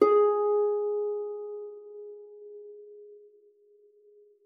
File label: HARP FORTE G4; Xsample VOL-8